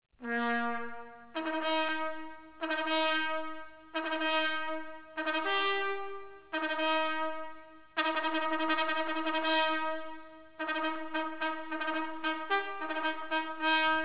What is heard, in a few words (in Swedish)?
uppbratt, signaler; dragoner